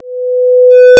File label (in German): ton; physik; akustik